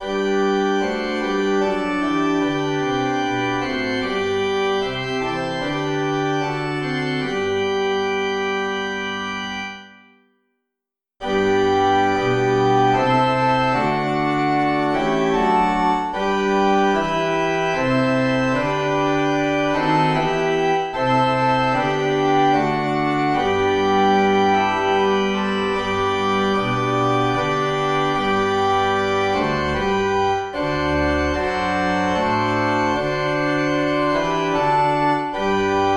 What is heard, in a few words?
Organ, hymns without words